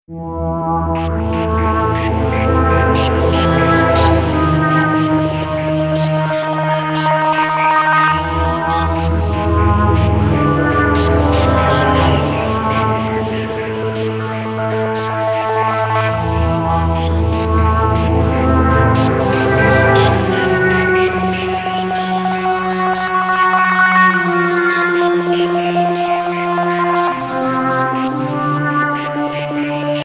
mysterious thief, only melody

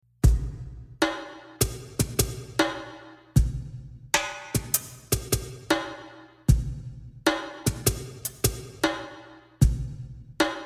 Hindi Meditation

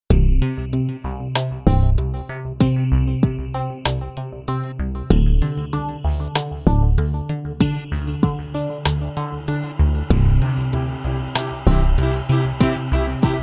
off melody

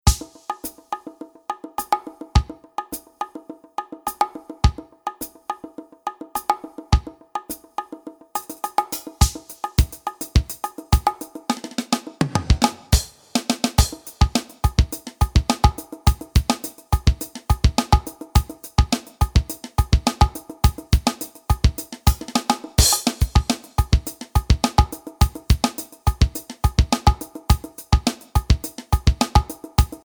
Only Bass, funk

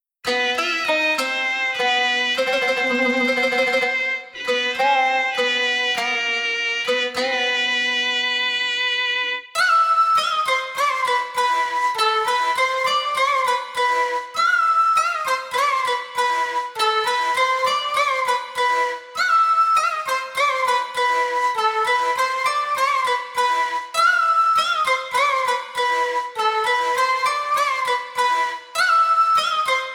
Oriental Beat only melody

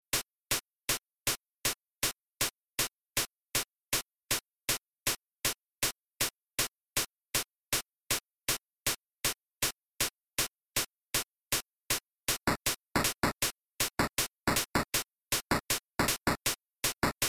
only bass

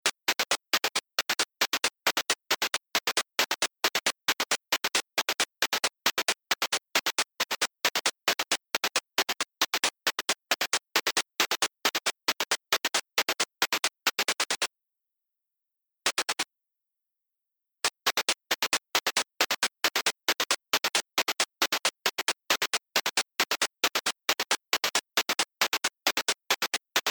only bass

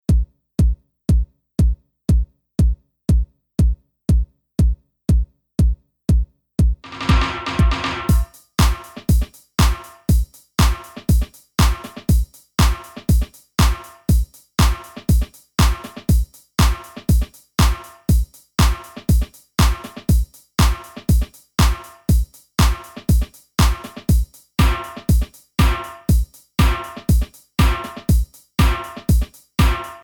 Only Bass, funk

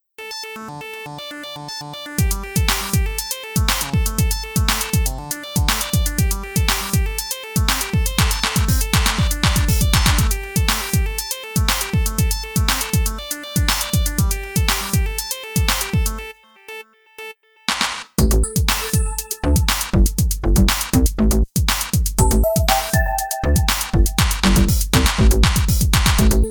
off melody

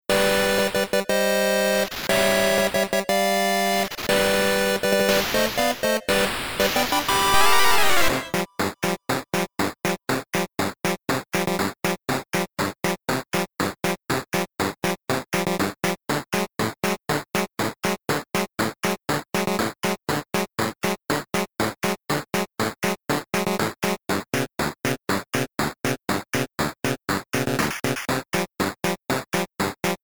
Silly March off melody

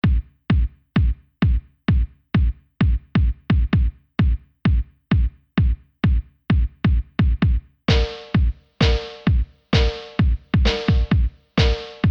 Only Bass, funk

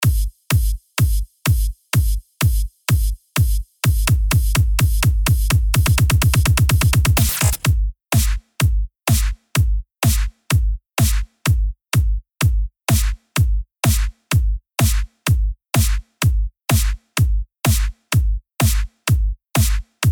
Rock Disco Drum FX

